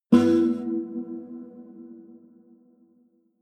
low string buzz dissonant high

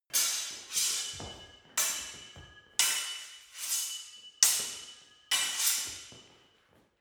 sword hit sword sequence

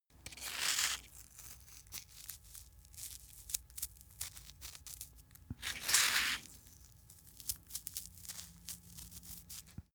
small animal eat crunch

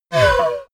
sci-fi laser shot short

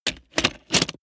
plastic mechanical handling lock

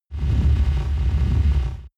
electric wings

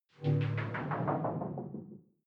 sci-fi critical bell bright

